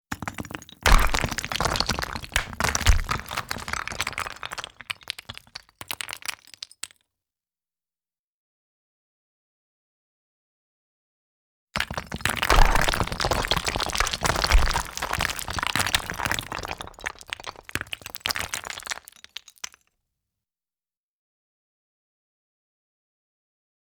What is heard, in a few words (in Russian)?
ПАДЕНИЕ КАМНЕЙ